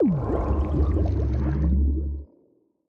conduit activate; minecraft; block

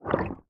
ambient, squid; minecraft

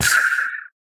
pufferfish death, minecraft

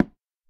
wood, dried ghast; minecraft snapshot; block

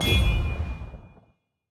trident return; minecraft 25w18a; item

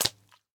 flop, pufferfish; minecraft 1.21.4

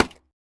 place, scaffold; minecraft 1.21.5; block

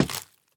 axe strip; minecraft; item

